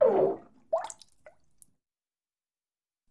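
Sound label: Bathtub Fart Short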